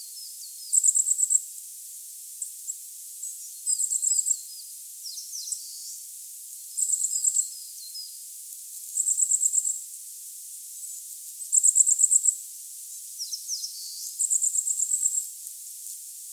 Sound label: Certhia familiaris